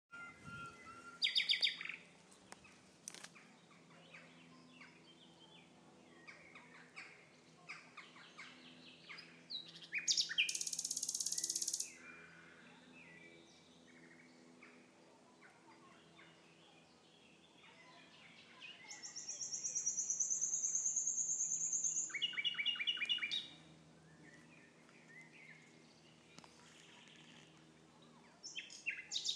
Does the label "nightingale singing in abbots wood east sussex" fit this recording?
Yes